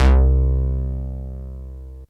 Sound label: D2 BASS